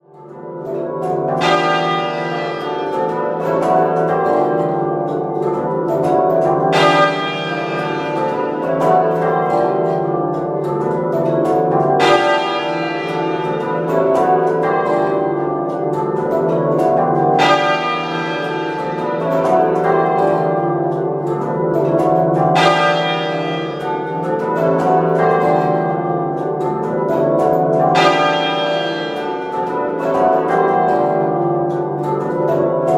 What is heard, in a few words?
Fullly Muffled, bells